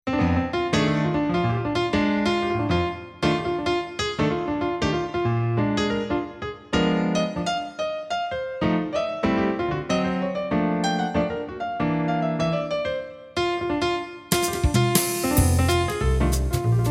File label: blues in f; ladyjazz